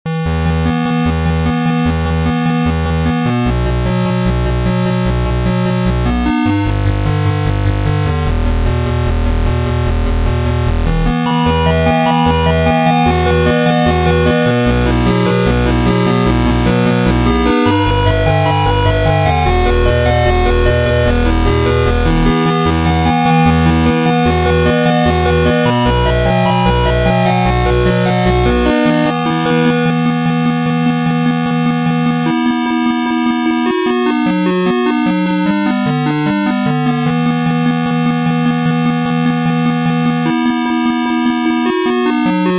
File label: riff